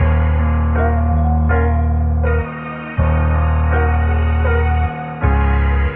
piano dark, Sad Loops

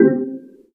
twister, Synths

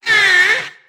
eee male, voice; ooh ahh

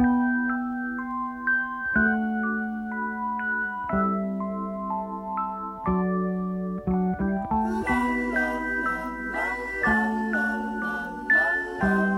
keys, sad loops

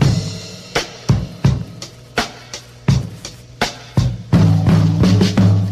Drum loops, Boombap

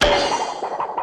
wobble

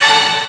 metallical, Stabs

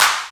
clubbin, Clap n Snap, Snares

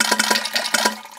shitting; Farts

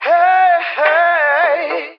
hey hey male SUNG

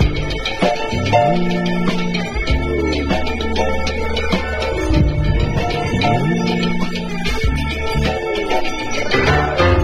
keys, phonky loops

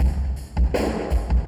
fulfilled, Drum loops Boombap

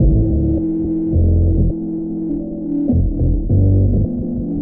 bass muted; Loops; Electronic

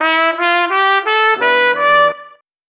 horns, phonky loops

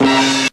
gonger

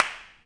cart door; Snares

